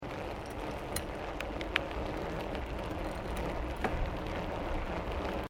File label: Bicycle Mountain Bike Road Gear Change; Bike near Tazawako Japan; Bicycles; Vehicles; SoundEffects-Foundation1